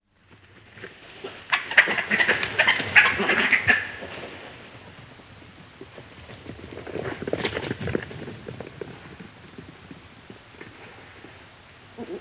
Dachs Geraeusche; Wildtiere in Europa

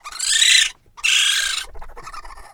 Waschbaer Geraeusche, aggressiv; Wildtiere in Europa

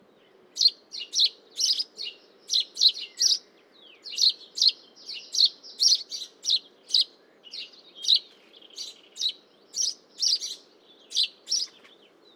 Haussperling Gesang

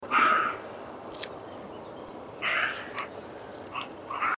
rehe die bellen

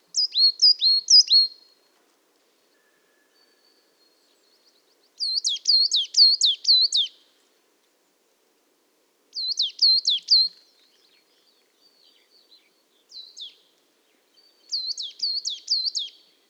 Tannenmeise Gesang

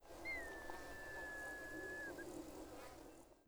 Rotmilan Ruf lang, Voegel in Europa

